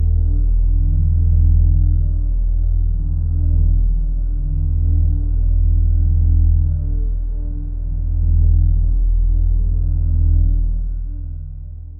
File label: low ambient hum dark slow